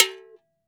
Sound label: AFRO AGO, AFRIC AGO GO